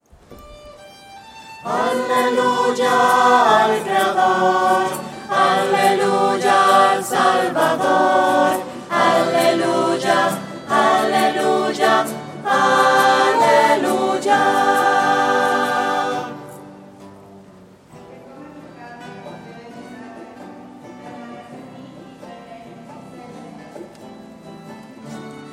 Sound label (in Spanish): aleluya al creador voces